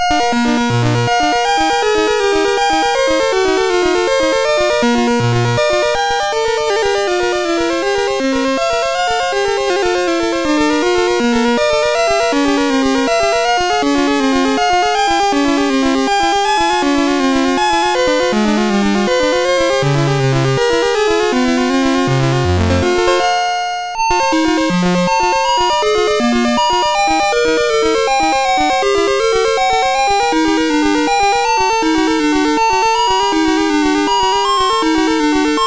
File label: Partita Gigue, MOS6581R3; hvsc